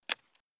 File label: footstep plastic; fallguys